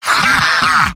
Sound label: laugh short; heavy; mvm